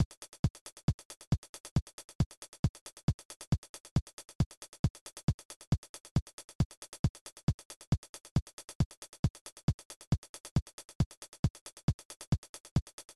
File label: Unused Drumbeat; snes; Spider-Man: Lethal Foes